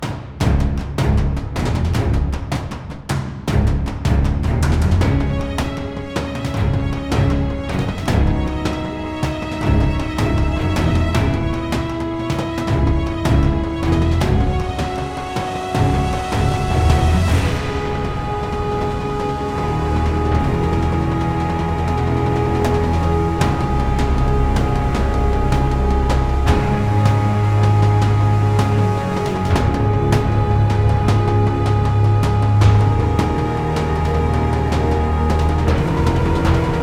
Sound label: Intro 156.5 BPM; Quick Mix